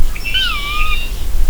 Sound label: Sora perweep; National Marsh Bird